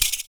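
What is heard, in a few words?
PERC, percussions; Univers Sons - Raricussions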